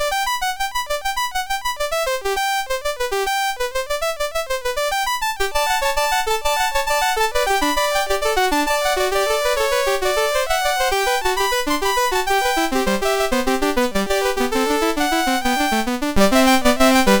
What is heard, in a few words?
Gigue, MOS6581; MOS6581R3; Gigue from French Suite No5